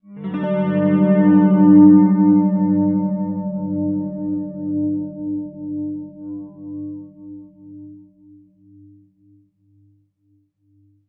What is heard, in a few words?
Guitar FX, Stringed Instruments, World Pop; Guitars; Sonic Foundry (Sony Creative Software)